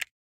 button hover away; dazzle

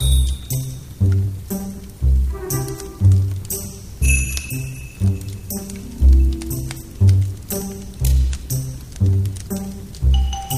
ambience general; jingle spin

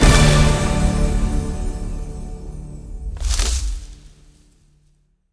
bigWin End; fairyhansel

